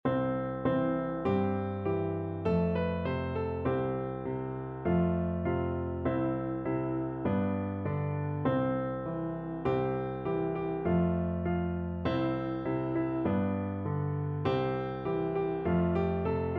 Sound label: piano, piano duet; Baa Baa Black Sheep